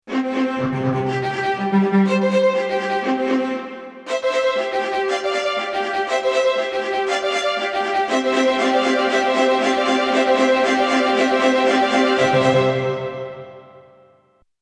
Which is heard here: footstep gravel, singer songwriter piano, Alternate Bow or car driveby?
Alternate Bow